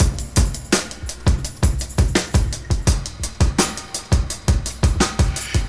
112 bpm fresh breakbeat sample D# key, Free drum beat - kick tuned to the D# note. Loudest frequency: 2353Hz